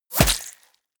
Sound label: bullet small impact body